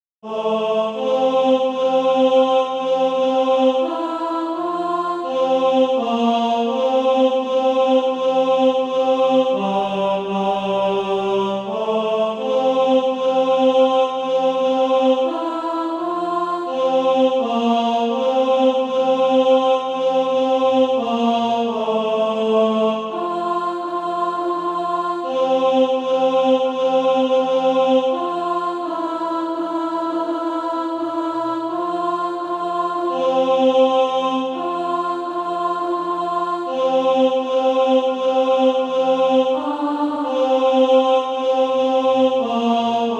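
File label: tenor, SATB Practise Music; Christ Who Left His Home in Glory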